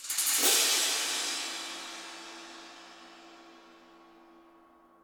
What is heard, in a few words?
Music transition music segment; Sifu